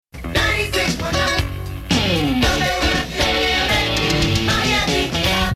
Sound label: Jingle, radio